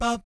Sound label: BAP A#3, Jazz; ILIO; Vocal Planet; Jazz & FX; JAZZCOMBO